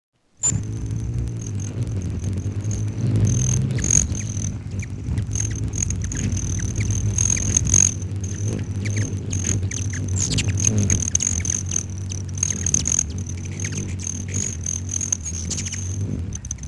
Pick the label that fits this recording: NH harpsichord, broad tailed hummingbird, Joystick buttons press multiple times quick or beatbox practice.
broad tailed hummingbird